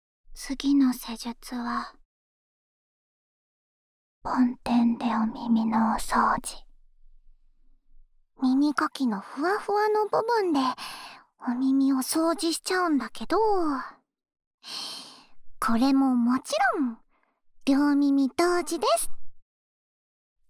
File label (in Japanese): 囁きながら両耳同時に梵天でお掃除（両耳）ASMR; ASMRver